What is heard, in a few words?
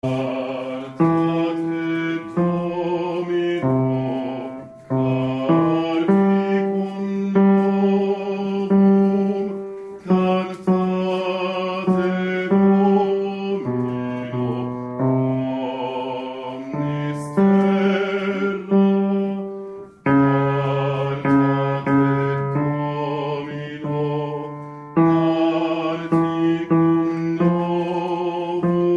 Basses; Cantate Domino